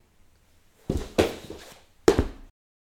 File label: Person Falling On Wooden Floor